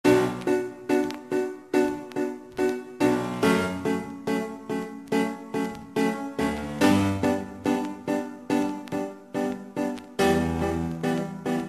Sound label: chill beats 70; Sunshine Mellow Melodies